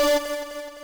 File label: lead d4